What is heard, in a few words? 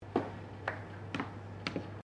Air Hockey Rebound Multi, Air Hockey table; Air Hockey (Sound Effects:Games)